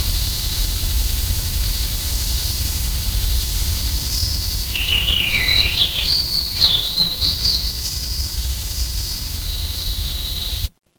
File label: ambience forest